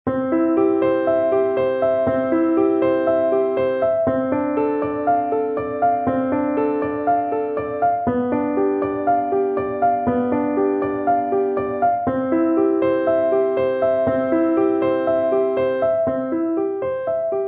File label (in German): Ave Maria (Saxophon Solo + Klavier-Playback)